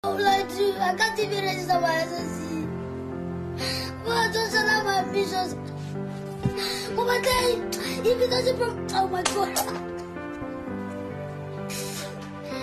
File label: black kid crying